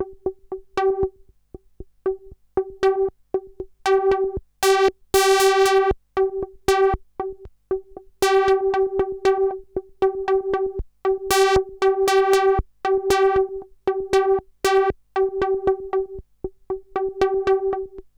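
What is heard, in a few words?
sample and hold G1; Best Service Dream Experience; R100M S+H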